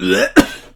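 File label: zombie pain; garrysmod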